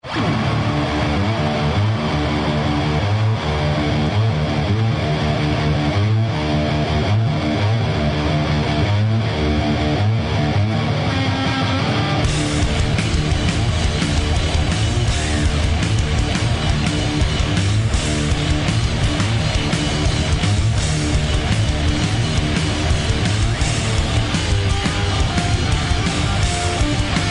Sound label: Riff